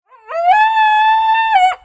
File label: Fox Action